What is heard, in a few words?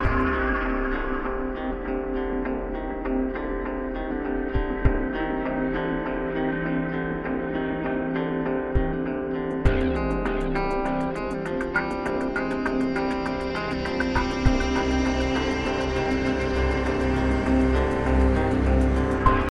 SYNTH; transformers war for cybertron gamerip